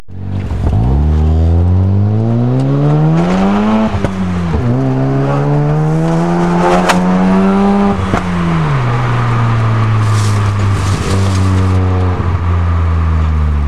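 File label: sa22c, Rx7 GT 12A Turbo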